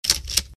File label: shotgun Sound